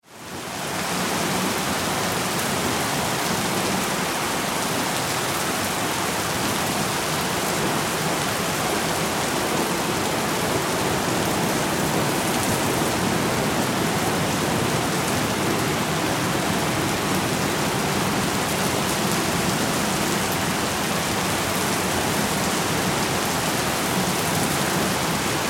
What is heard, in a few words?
Weather Rain Pour Heavy Ambience